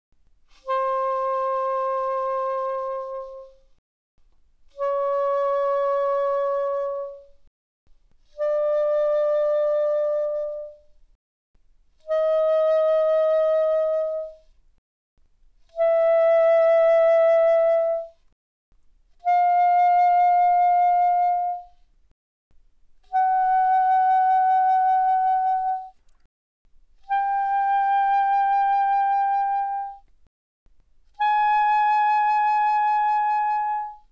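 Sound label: Soprano Sax Vibrato pp C5 B5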